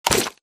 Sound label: bullet light ballistic mud debris; Titanfall 2